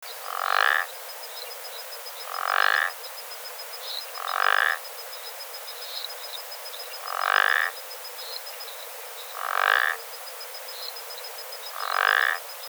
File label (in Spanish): Ejemplo de sonido, ranas y sapos del sur de Brasil y Uruguay; Guía sonora de los sonidos de ranas y sapos del sur de Brasil y Uruguay; Fonoteca Zoológica; Alosa (Sonidos de la Naturaleza)